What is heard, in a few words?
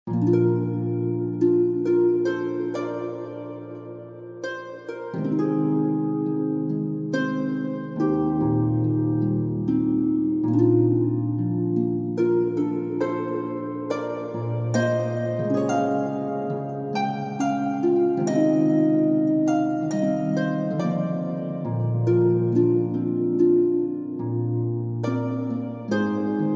Harp A